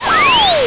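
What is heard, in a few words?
Reverse Noise FX, Fairlight CMI III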